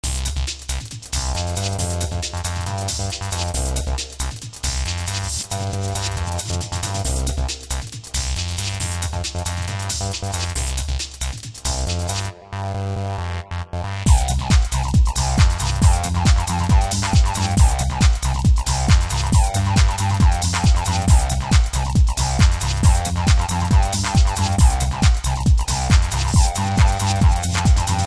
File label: happy organ